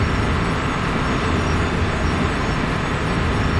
engine; Star Wars Knights Of The Old Republic I; XBOX

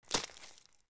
FS Mud, footsteps

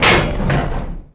lift stop; Tony Hawk's Project 8; XBOX

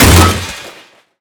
Impact Large; Totaled; XBOX

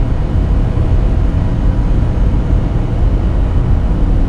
hangar, ambience; Star Wars Jedi Knight II Jedi Outcast; XBOX; artus

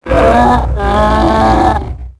howl; tusken King; Star Wars Obi Wan; XBOX

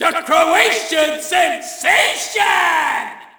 Shout, audience; UFC Tapout 2

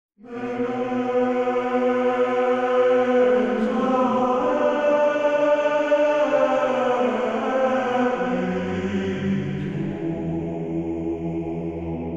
Monk Vocals; music; The Da Vinci Code; XBOX

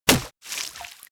water hit, bullet hit; projectiles; Max Payne

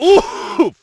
ooff; Splashdown; XBOX

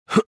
jump; Star Wars Jedi Knight II Jedi Outcast; XBOX; bespincop

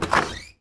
door open; BedRoom

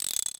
text flash

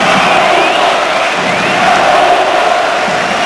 Chant, Crowd; David Beckham Soccer; XBOX

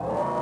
hangar crane start; machines mechanical

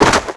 jump Land Snow; characters

